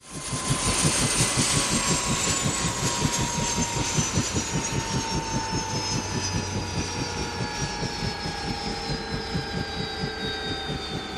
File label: UH-1 Huey: Interior Idle And Power-down